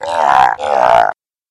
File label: monster sludge